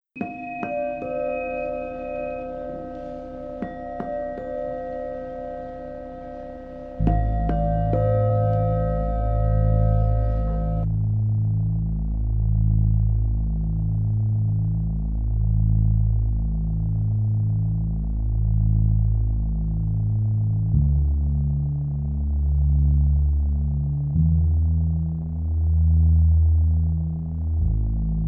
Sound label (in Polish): czakroterapia medytacja 432 Hz; medytacja w gore